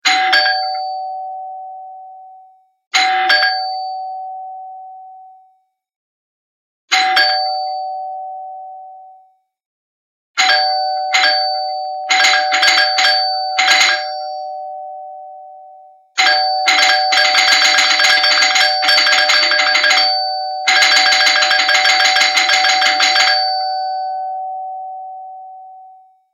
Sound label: DOOR BELL; Samsung; GT-B7620 Giorgio Armani